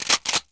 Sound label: reload; Messiah; WEAPON